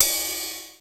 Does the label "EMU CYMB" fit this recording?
Yes